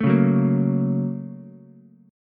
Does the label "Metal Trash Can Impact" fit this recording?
No